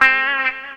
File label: WAH TANG, Funky Gtr Licks; E-MU Formula 4000 Series Vol. 1; Hip Hop Nation